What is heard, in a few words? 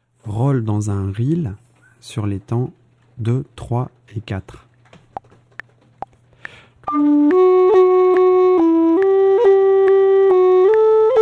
roll reel, irish flute